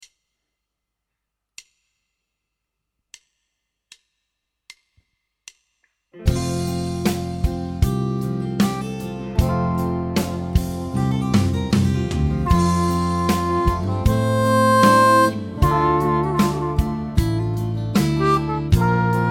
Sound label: F 77 harp